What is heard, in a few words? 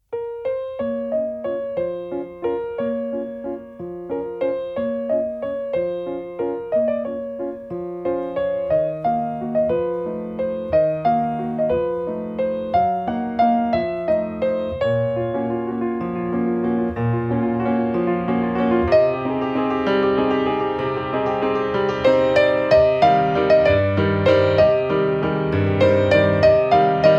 Circus waltz